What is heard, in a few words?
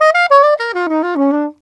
Soprano Sax in Bb; Sonic Foundry (Sony Creative Software); Jazz Solos and Sections; Traditional